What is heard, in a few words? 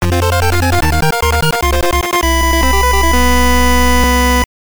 Bonus Hit; Densha de Go; GBC